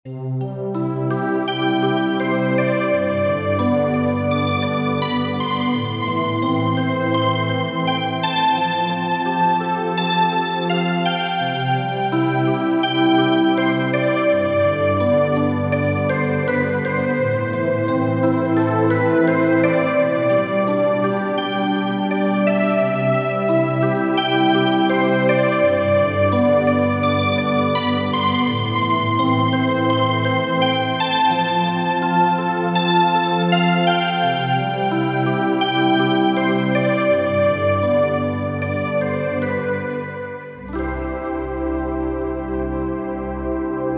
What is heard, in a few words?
pad